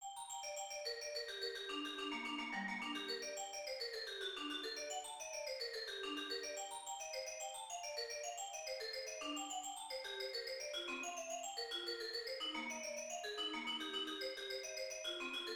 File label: Mallet Track